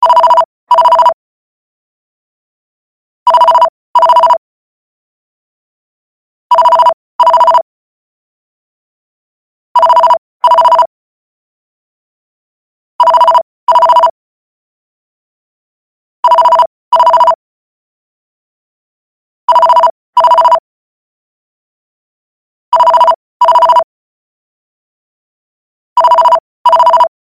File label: landline phone ring; ringtones